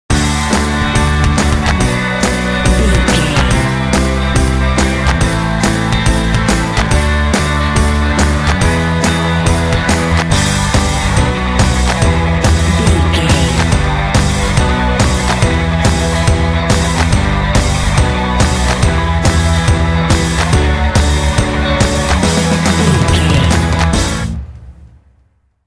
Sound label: cheer happy song bumper